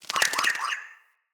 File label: flock bird attack dive; Characters; Enemy; Abilities; Sinecure; Ranged Hunter Thawtide